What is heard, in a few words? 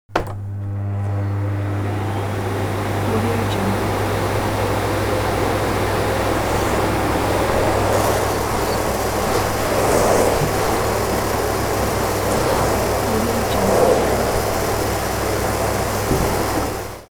object household vacuum moving